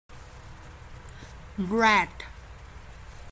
rat

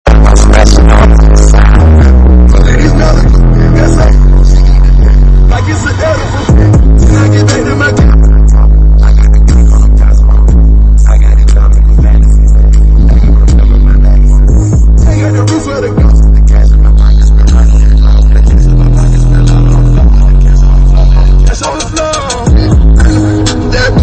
bass boosted

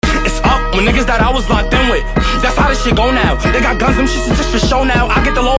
drill; nyc

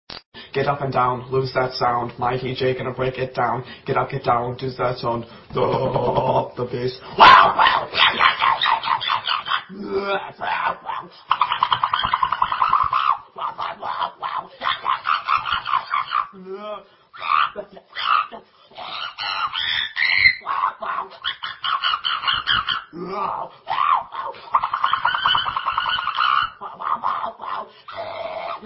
worst beatboxer ever

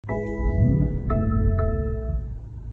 warning chime

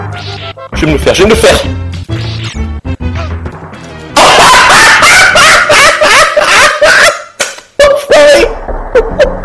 ahahahahahahahah

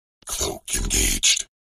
cloak engaged; crysis2

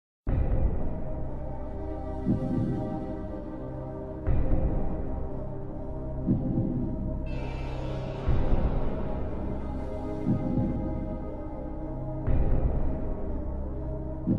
suspense; millionaire

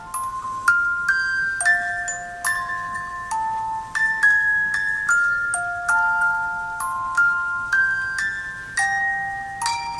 music box

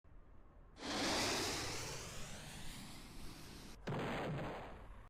rpg sound; roblox

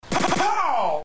pow

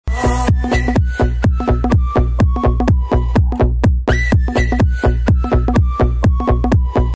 vip song

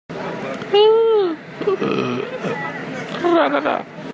ahh uuh ragaga

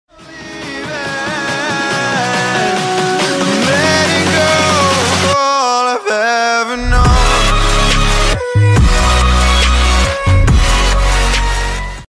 alert sound; twitch hitbox

